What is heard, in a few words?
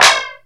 Claps; Vengeance Samples